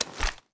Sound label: ar xmag reload empty fast end, weapons; gmod; viper